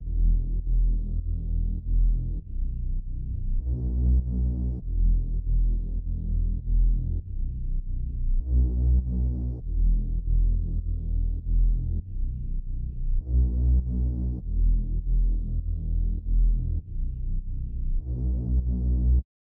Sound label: 100 BPM E Min Bass; Loop Stems; Cymatics; Infinity Melody Collection; Betrayals